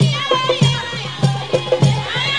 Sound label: HAPPY